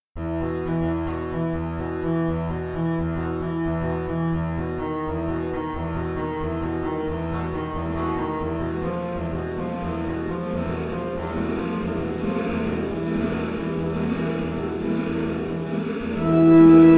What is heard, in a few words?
Horror Game